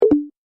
disconnect call; snapchat

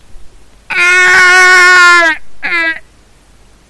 wild bear attack

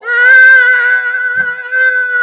war cry

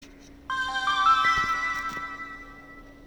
startup; psp

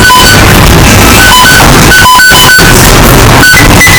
distorted beep; mcdonalds

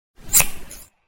scissor cut

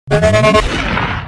stutter sfx

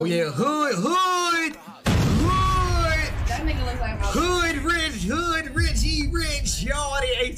hooooood

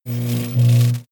tv buzz noise

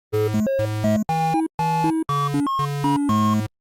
state farm jingle

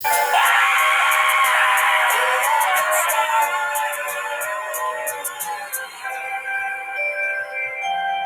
scream longer; bodoque

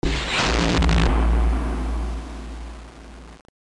vine boom

